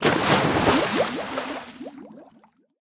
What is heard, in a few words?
Removable Splash